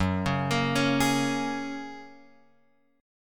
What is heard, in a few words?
F sharp Major Add 9th; F#add9 Chord {2 4 X 3 2 4} arpeggiated